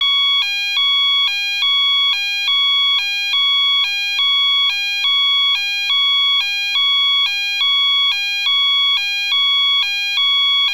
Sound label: AMBULANCE, Piezo Siren